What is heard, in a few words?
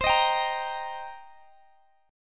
C Minor 7th C Guitar Open D